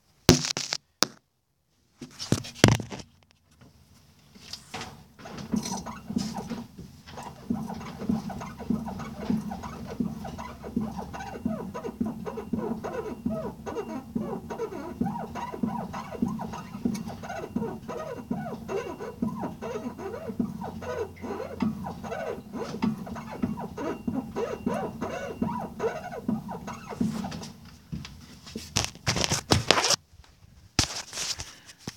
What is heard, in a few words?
squeaky rear springs squeaky rear end